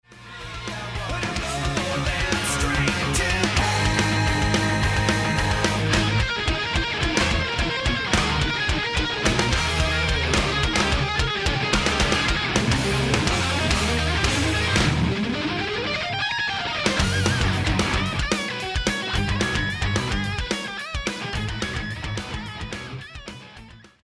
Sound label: war solo